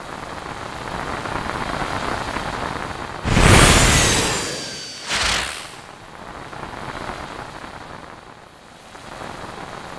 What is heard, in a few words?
Hover Board Idle; Seer; GamigoNA